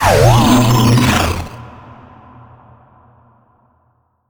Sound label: luteboss confuse; Crypt of the NecroDancer